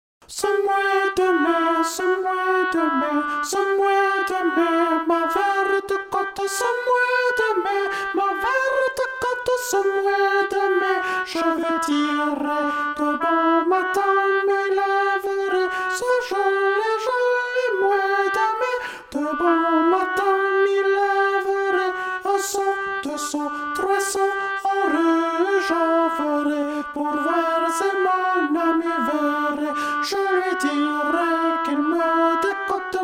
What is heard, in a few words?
alto; Ce moy